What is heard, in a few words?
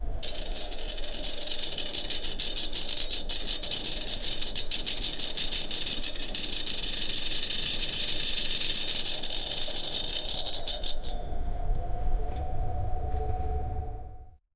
spinning cable winch